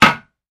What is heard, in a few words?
Toilet: Seat Up / Down.